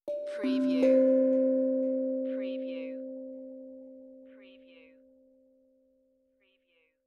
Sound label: Tongue Drum Meditative Tone; beanstalk audio